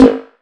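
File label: snare processed, Disco House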